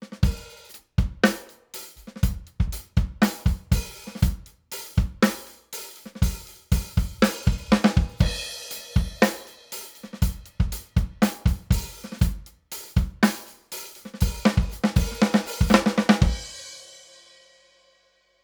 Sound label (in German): Golden Age Project Comp 554 MK II Drums ohne Comp 554